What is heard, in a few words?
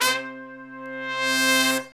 BRS, Brass Sections, R&R Horns; Roland LCDP06; R&R Sect.sfz